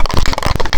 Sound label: This One Is Weird, Foley Wavetables; Cymatics; Foley Wavetables Vol 1; Serum